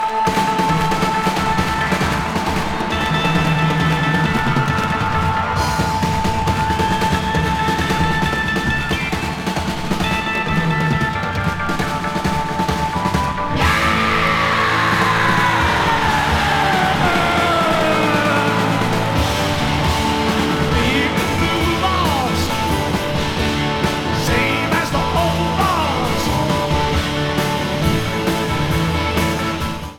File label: Drum Solo; Super Groups In Concert; Toronto, CA; 12-17-82